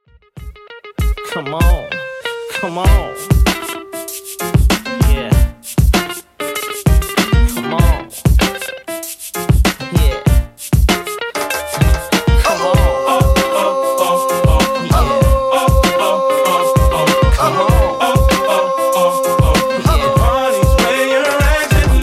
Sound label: Throwback Rnb Redrum 97bpm